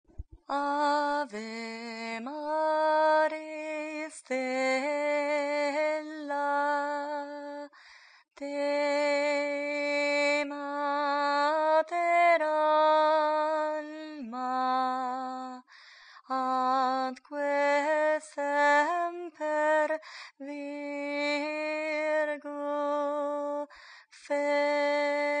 Ave maris stella - Contralti; Perosi

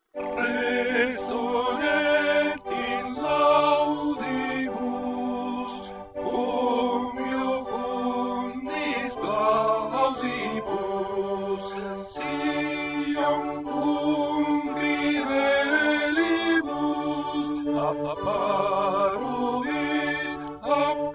Resonet in laudibus - Tenori+Base; Puer natus

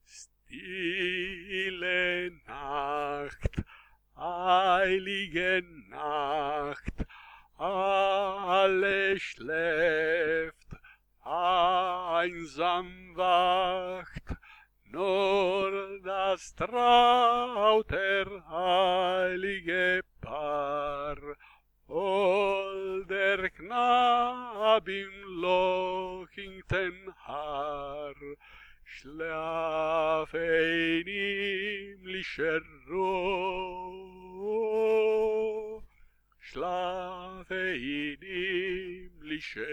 Stille Nacht - contralti; Natum de Maria Virgine

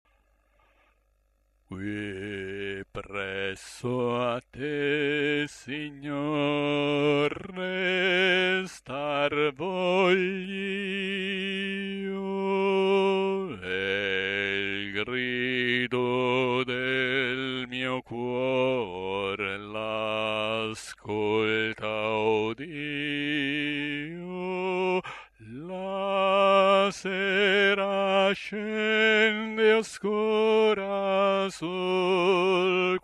Bassi; Qui presso a Te; San Francesco

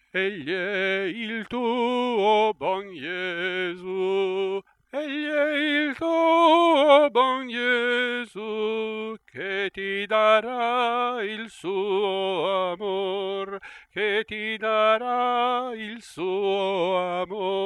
Tenori; Egl e il tuo bon Jesu; Passio

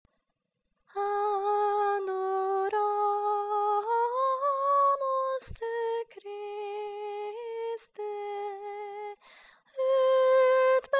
Adoramus Te Christe - Soprani; San Francesco

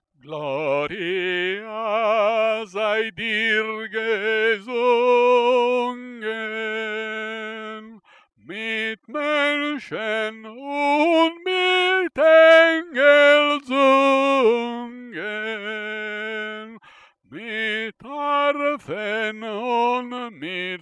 Gloria sei dir gesungen - Soprani; BWV140-7

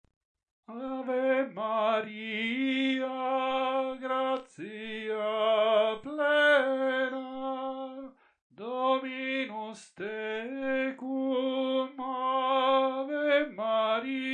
Ave Maria - Tenori; Arcadelt; Rosario solenne

